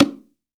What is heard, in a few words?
STEREO BONGO